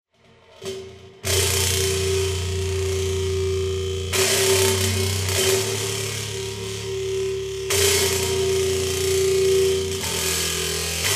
bass through gong

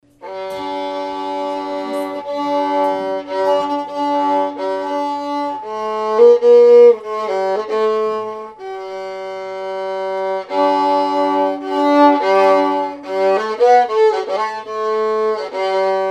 violin

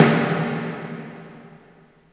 snare, drums

